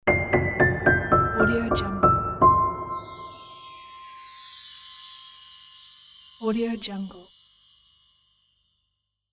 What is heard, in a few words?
fairytale story piano ending